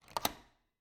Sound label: Cabin, front door, deadbolt, lock, latch, thick, close, wide; Eiravaein Works; Vaeyan IV